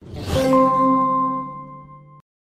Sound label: Power On; LG Stylo-2-LS775; Sprint